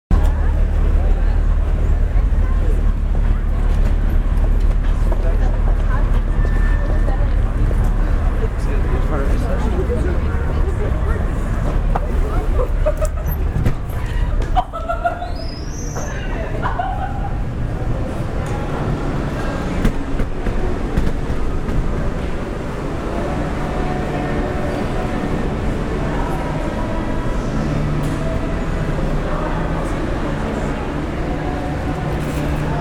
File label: from the street to the box office; Raw Recordings; Soundscaping the Paramount